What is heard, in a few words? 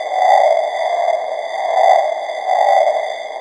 grasshoppers; de tabocas